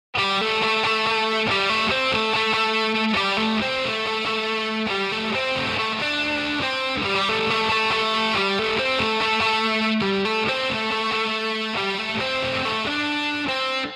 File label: stereo Guitar Dist